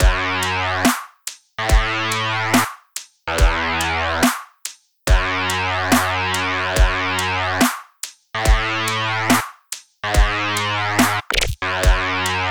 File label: Basic Dub